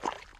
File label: mud, footsteps walk